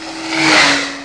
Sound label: truck